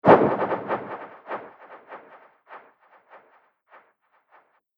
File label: Fantastic Cloak Movement; Magic Sound Effects; Fantasy Sound Effect; Andrew Fleming